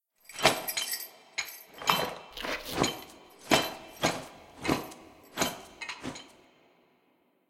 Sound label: sleep exit phase